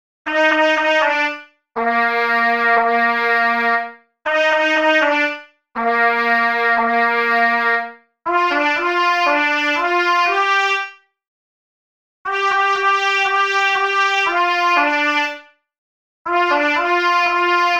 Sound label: trumpet